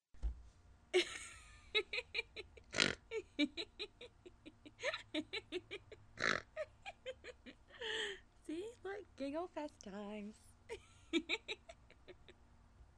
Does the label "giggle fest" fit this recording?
Yes